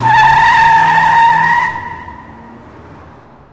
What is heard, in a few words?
Car Peel Out No Rev